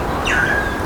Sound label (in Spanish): boyero